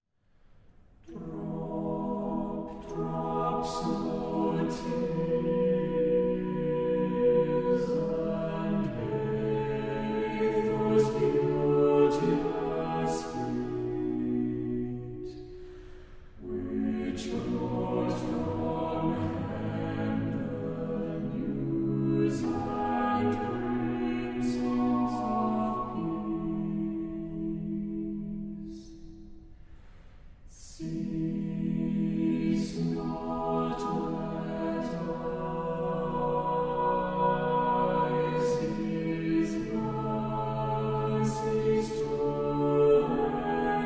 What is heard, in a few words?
Drop drop slow tears; Gibbons